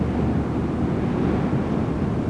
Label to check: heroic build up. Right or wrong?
Wrong